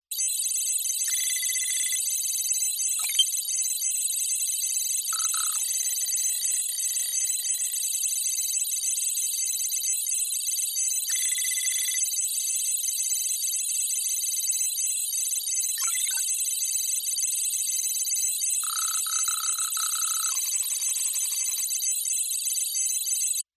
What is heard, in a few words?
Interface Micro Telemetry; lesser vibes